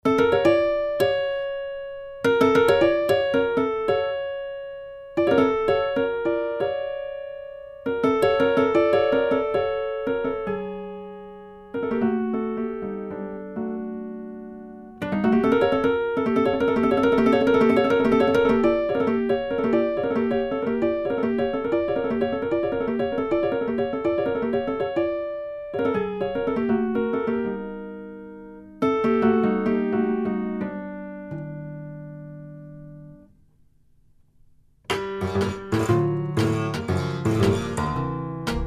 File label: piano sonata